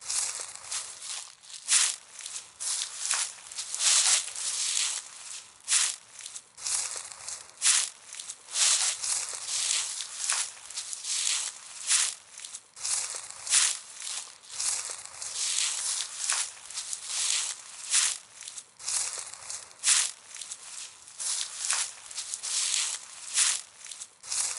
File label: dry leaves footstep loop; 足音